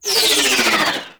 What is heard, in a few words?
Ship Dual Cannon Moa Tail; Warframe; Weapons; Corpus